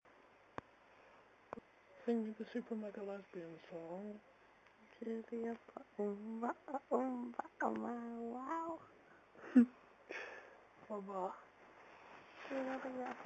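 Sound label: my girlfriend singing half asleep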